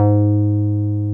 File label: 303 MH, TB-303; Greytsounds VOL-1